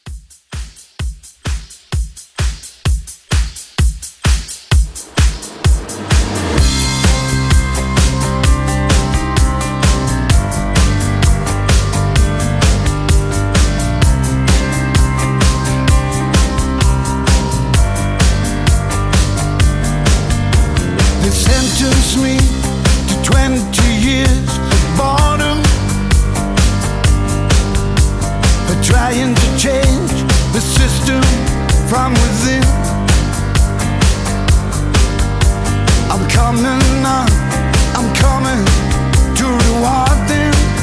90s Rock Re-Drum 129

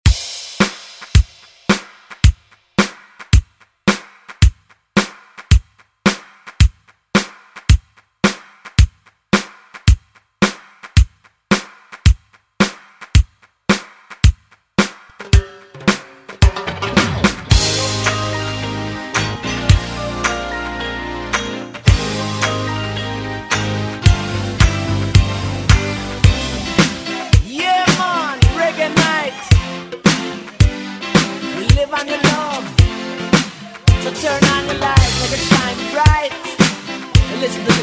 Reggae 80s Re Drum; DJ Mhark

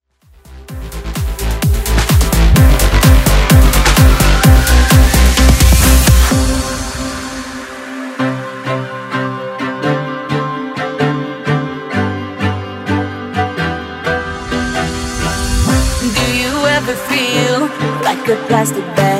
Festival Quick MashUp

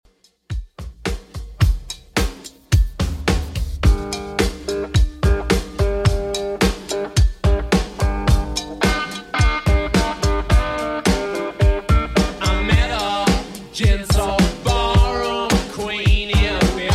60s Redrum 108bpm

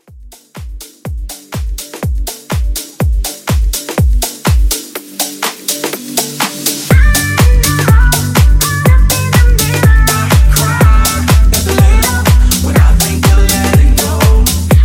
house mashup 123 clean

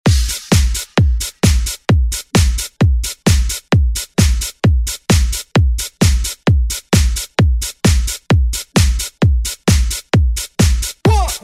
Hit Dance Redrum; Deejay Hit

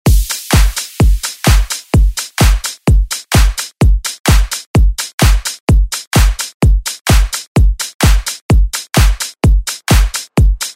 Party Hype Redrum; Deejay Hit

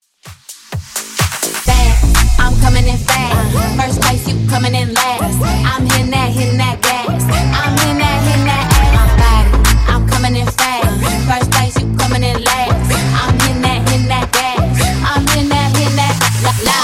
Dj Intro Outro 128bpm; remixplanet

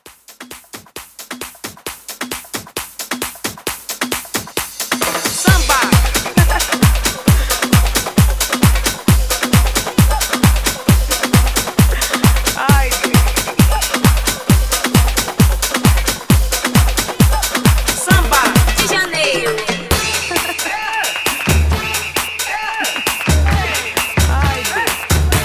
Club Re Drum 133

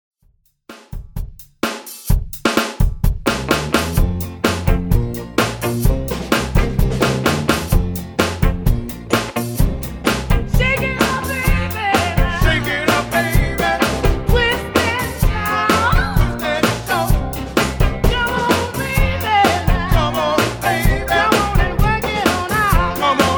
50s Redrum 128bpm

